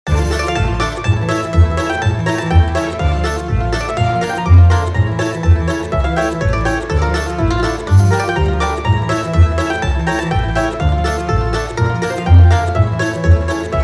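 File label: moscow mandolin balalaika russian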